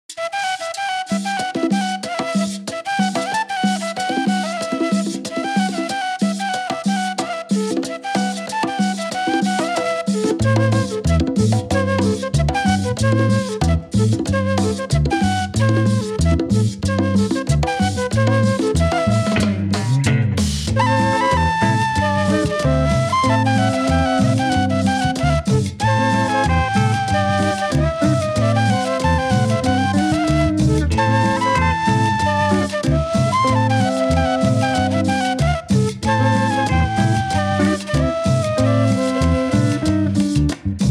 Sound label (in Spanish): La Cumbia de las Medusas